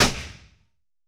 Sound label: Pistol Shot 22cal; Weapons & Firearms; Sony Pictures Sound Effects Series Volumes 1-10; Sony Creative Software